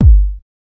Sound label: 00s rich low end kick single hit g key